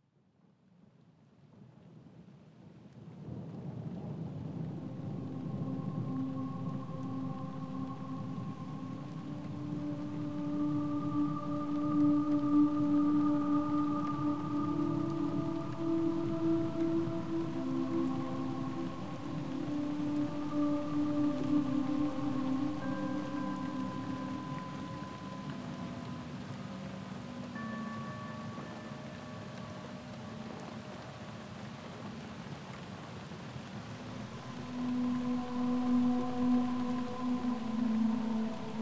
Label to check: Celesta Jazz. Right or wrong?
Wrong